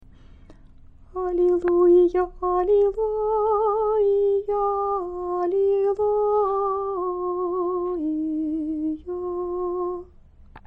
halleluja, singing

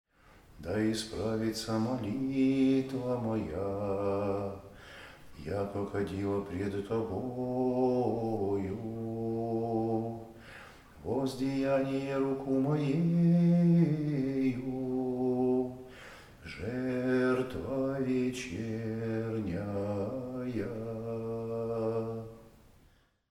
greek, singing; da ispravitsya

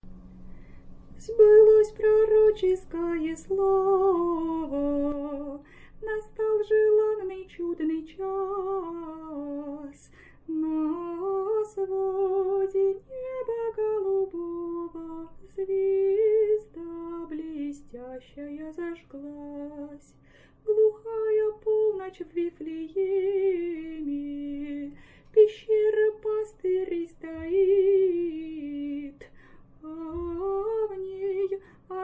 prophet word voice, singing

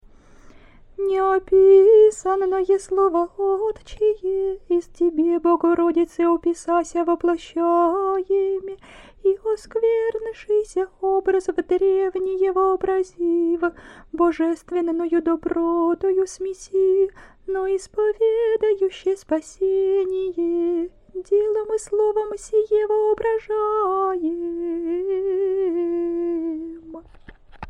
orthodoxy triumph kontakion, singing

